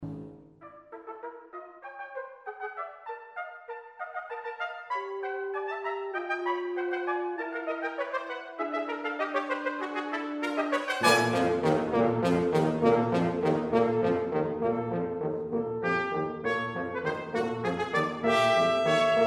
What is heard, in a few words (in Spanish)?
quinteto metal